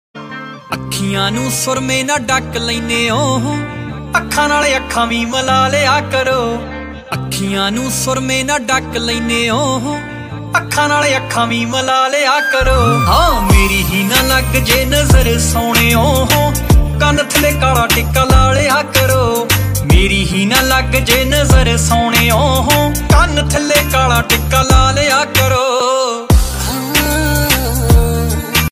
panjabi ringtone

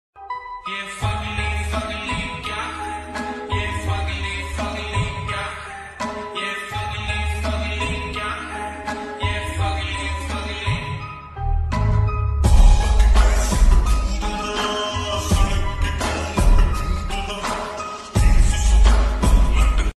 lofi hindi ringtone

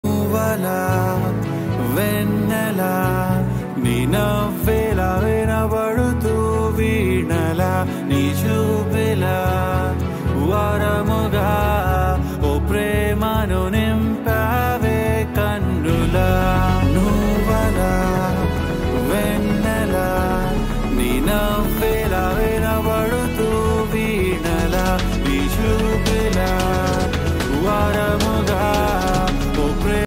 male version telugu ringtone

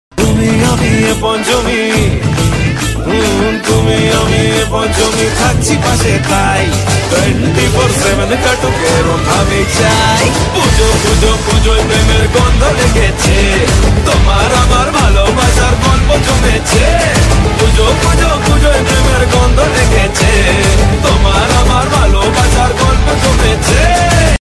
bengali ringtone

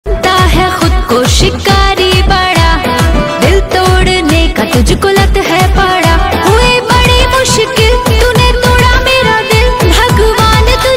bhojpuri ringtone

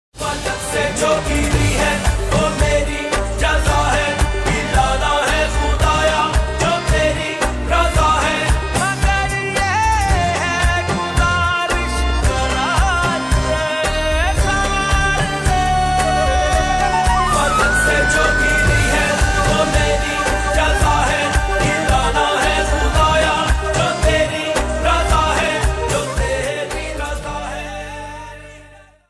pakistani ringtone

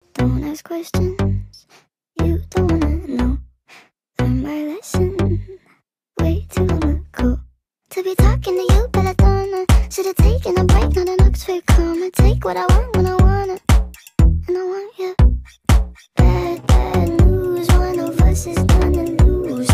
speedup ringtone

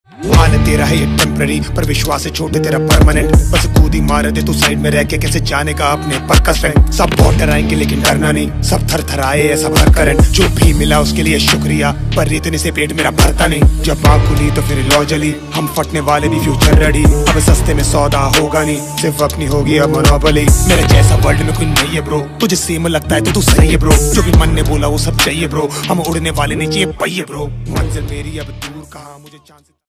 hindi rap ringtone

